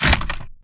Damaged Torso scrape Concrete; AI Stalker; Titanfall 2

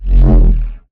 Movement Jog; Titanfall 2

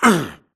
grunt hits; Titanfall 2

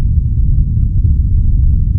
deep rumble; Titanfall 2

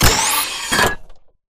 arc panel tall activate; Titanfall 2

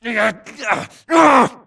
grunt Struggle; Titanfall 2

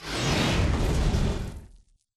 atlas dash slide; Titanfall 2

